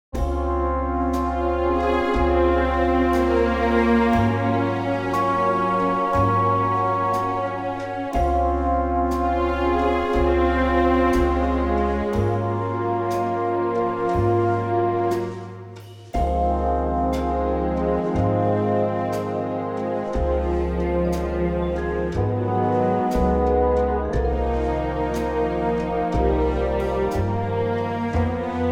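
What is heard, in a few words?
orchestral D flat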